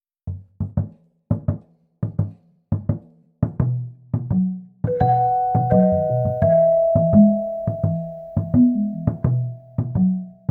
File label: Marimba Vibraphone Chimes Bells